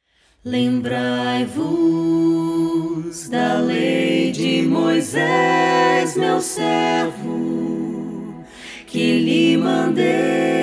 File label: acapella